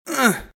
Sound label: hurt; RadwinIsland